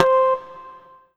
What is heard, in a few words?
Korg N1R Harmonics C5